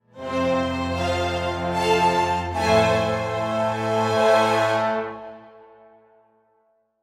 Play Stinger

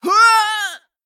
female vrykul, Death

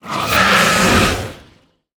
Bittertide Hydra Attack

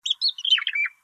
Silver Fledgling Play

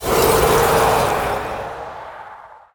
Ice Walker Death

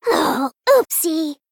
Female Gnome Death

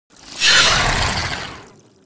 Grotesque Dragonhawk Attack

